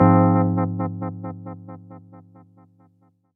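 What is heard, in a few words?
Fm chord; Waiverz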